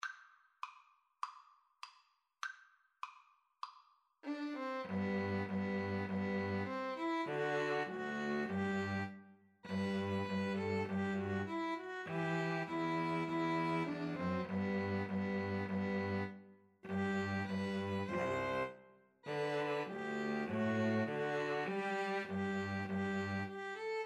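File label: christmas eve 2 Violins Cello karaoke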